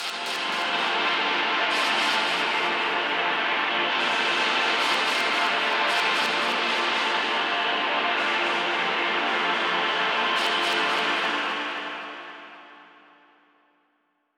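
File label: dark ambience; meteor secrets; ambient or nature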